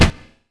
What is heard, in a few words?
Apache Fire; valve